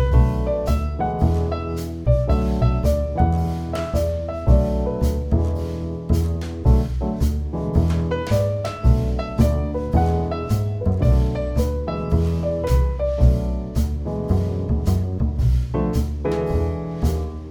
Ringtone; Samsung Galaxy S5 (USA)

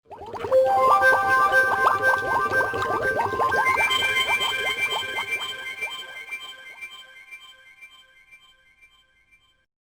Samsung ringtone Bubble

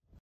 Calf

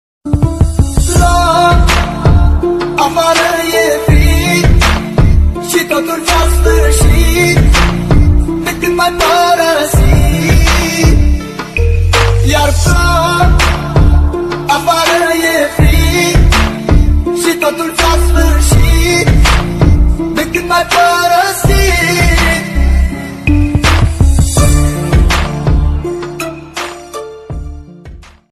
Sad Ringtone English Ringtone Mobile Ringtone

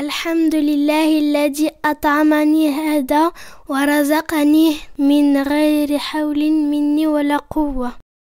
Islamic Ringtone; طب ما بعد الصندوق; Khane K Baad Ki Dua

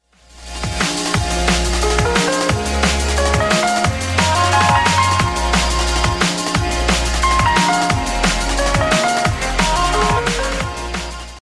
Abstract ringtone

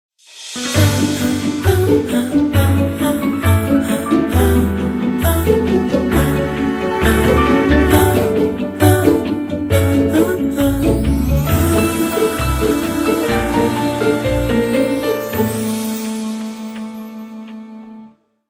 Pizzicato; Samsung ringtone

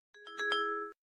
Black berry sms

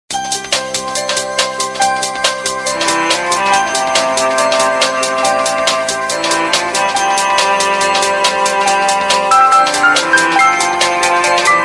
All Techie, ringtone; Samsung

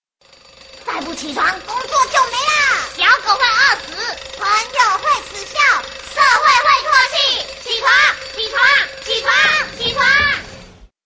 Chinese Ringtones

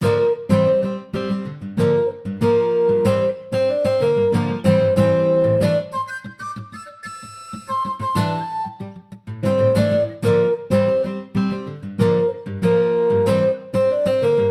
Funky Flute ringtone; Samsung

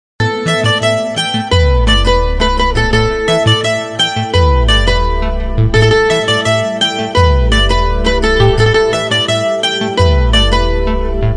Hindi Instrumental Ringtone Romantic Ringtone Sad

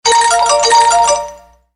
Samsung ringtone Sparkle Tone

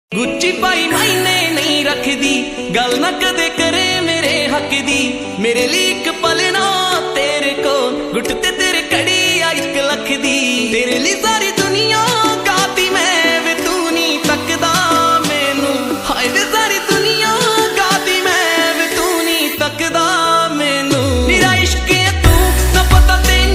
Punjabi song ringtone Love ringtone; Mobile Phone Ringtone

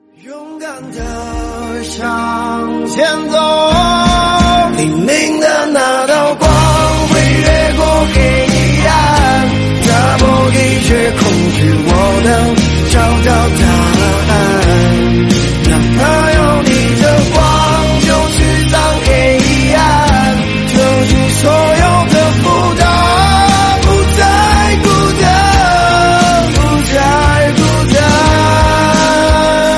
Chinese Ringtones

